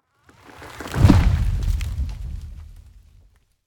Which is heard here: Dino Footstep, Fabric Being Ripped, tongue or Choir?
Dino Footstep